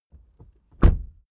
suv close door soft

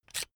electric drill template adjust heavy out; maschinen; bohrer; soundpool

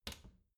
bathroom cabinet door creak close soft

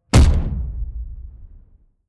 sci-fi explosion bend reality; schusswaffen

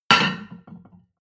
plate on plate place hard, restaurant cafe

